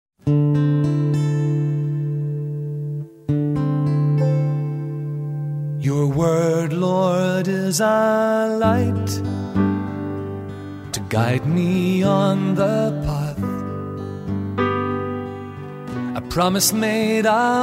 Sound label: Piano